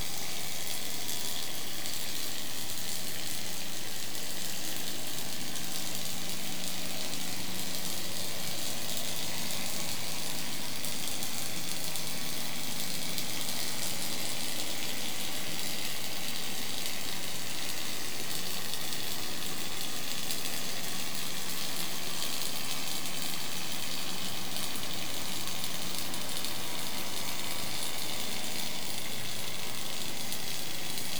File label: Noise